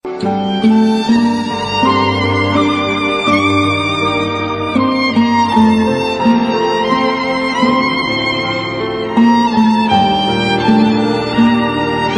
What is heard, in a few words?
sad violin ringtone